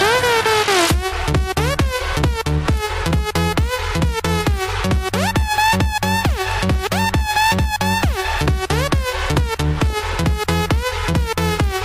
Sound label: russian electro ringtone